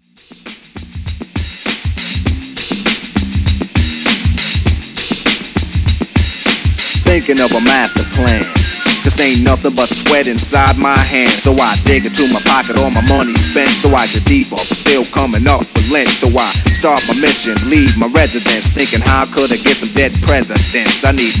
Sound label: Old School Redrum 100 Bpm